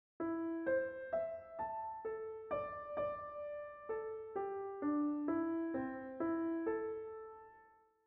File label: name music pentatonic